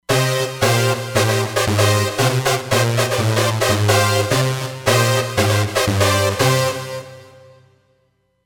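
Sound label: Neo Super Brass, Roland Fantom XR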